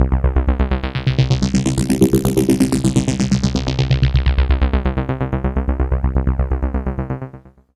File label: Casio VZ-10M Pulsating C2